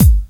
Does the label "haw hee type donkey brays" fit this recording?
No